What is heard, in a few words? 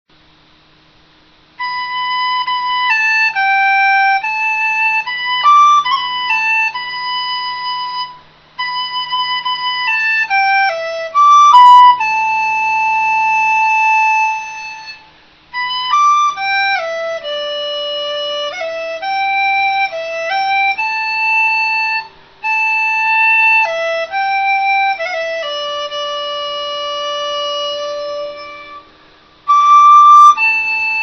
dizi